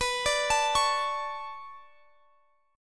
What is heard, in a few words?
B Minor 9th B, chord